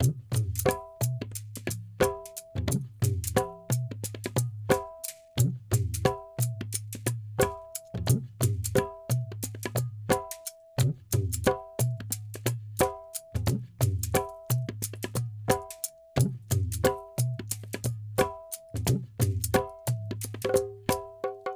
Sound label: perc mix 089 no duff, Hip Hop India, 089bpm; Hip Hop India Modern Percussion; Apple Loops